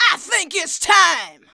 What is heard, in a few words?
VOX, Singin